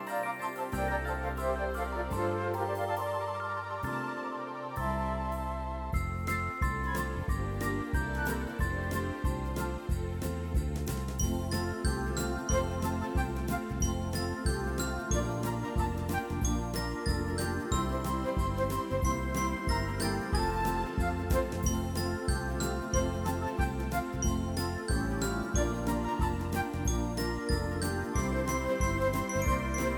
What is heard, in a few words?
jingle bells